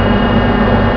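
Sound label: TANK IDLE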